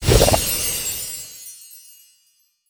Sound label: spreading wild